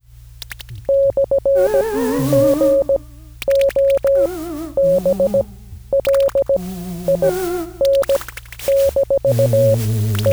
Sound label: real goblin audio duct capture